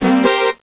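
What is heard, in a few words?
TWO TRUMPET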